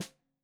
Snare 65 Reg Stereo, Deeply Sampled Drums

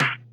Percussions

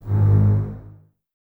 BSS G#1, String Ensembles, Basses, Detache; Miroslav Vitous; 90 s Sample CDs

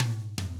Double Platinum Drums, County

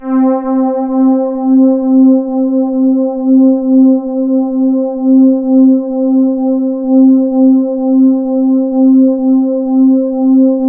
C4, Synth Line; 90 s Sample CDs; NiceBeats; SynthLine VOL-18

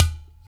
UDU, African

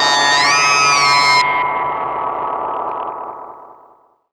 DD SYN, Disco House

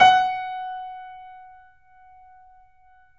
F#, 3-D Audio Collection, 3D Pianos, Yamaha C7; E-MU Producer Series Vol. 5

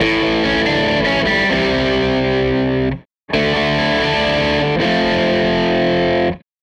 Les Guitar Loop; Sonic Foundry (Sony Creative Software); Six-String Orchestra

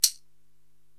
Caxixi-Soft; Korg TR-Rack

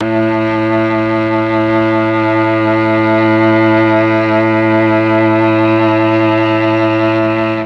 A2 TRON CELLO; Mike Pinder - Mellotron Archive CD

